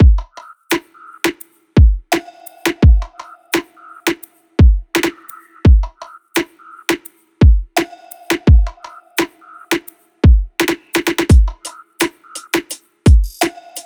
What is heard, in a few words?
loop full 85; Seismic Loops